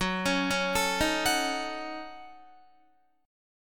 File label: F sharp Minor 6th F sharp Guitar; F#m6 arpeggiated; 13,16,13,14,15,14